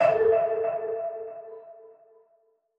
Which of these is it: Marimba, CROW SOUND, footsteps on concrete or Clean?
Marimba